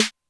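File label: Snare Lo Rap, Alesis D4 drum machine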